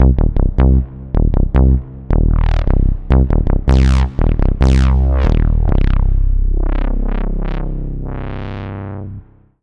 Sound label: Moog Fat Bass